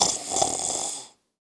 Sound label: snoring human; soundscrate